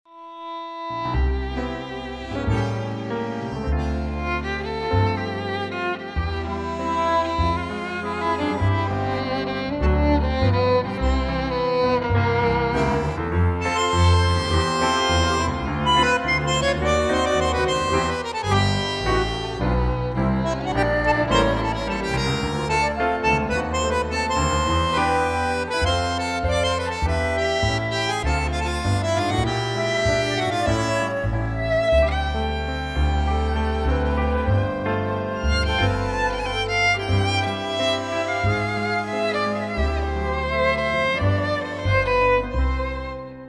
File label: C play full flute reference